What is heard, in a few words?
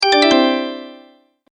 multimedia tone alert